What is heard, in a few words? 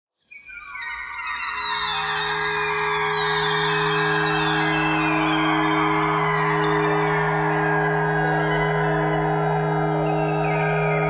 clarinet notes clarinet thread noise; clarinet geese